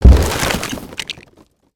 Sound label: Present Smash; destructibles